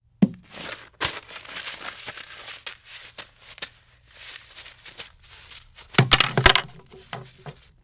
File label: Paper Handle; Fairlight Sound Lib